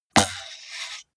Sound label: computer mouse draw drop hard